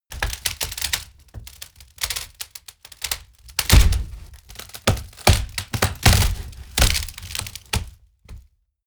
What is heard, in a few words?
wood break large; berufe; foerster